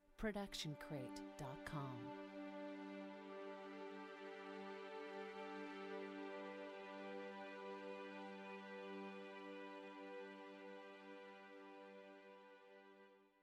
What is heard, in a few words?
feel good strings phrase; soundscrate